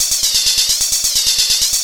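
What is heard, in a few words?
hi hat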